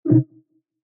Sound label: ui place